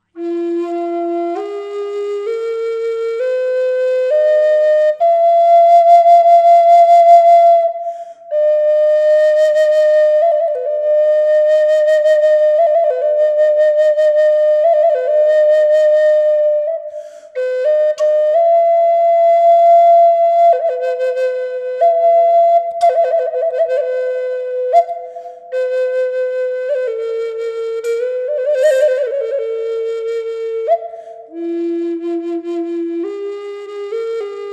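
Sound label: reverb, flute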